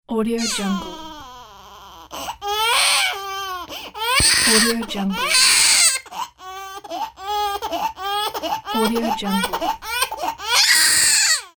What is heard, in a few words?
baby crying